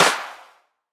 hand clap f key, Royality free hand clap sample tuned to the F note. Loudest frequency: 2482Hz; percussion; single hits one shots